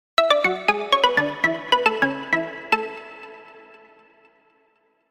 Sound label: nokia tone